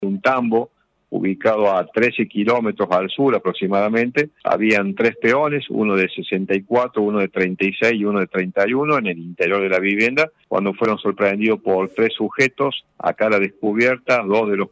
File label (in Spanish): tambo